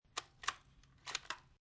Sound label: M40 Sniper Rifle Load; Rifles; Guns; VRSonic